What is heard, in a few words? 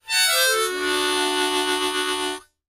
Mouth Organ Vibrato, harmonica; woodwinds